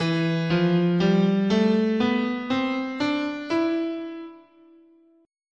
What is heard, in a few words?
E Phrygian, guitar, scale